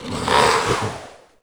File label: serpent; tf2pets